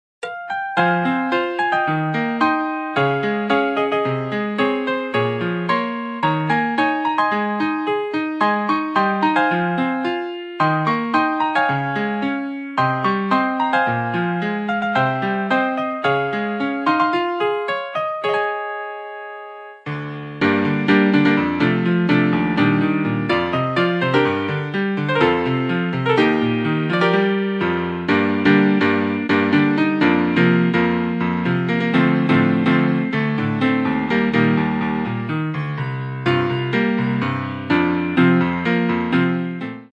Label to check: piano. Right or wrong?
Right